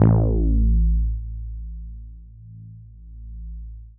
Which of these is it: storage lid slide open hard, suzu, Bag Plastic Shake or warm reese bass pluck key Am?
warm reese bass pluck key Am